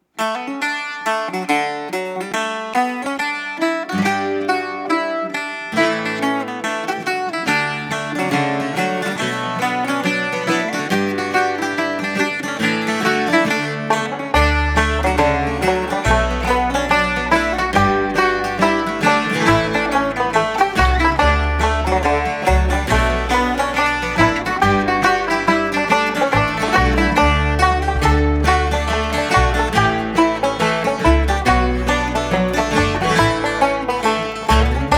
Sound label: hornpipe